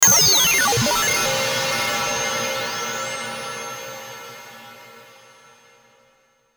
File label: Game, transition